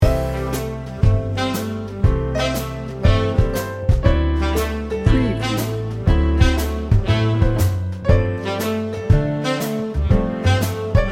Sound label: big band swing